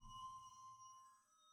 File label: Mirror Hover; netent